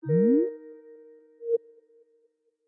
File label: zvuk skajp skype call connecting podklyuchenie